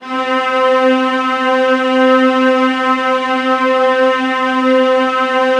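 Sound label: VIOLAS D4; ILIO; Synclavier Strings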